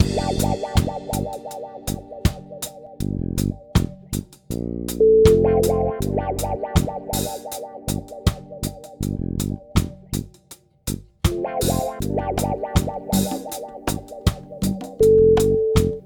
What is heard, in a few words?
reggae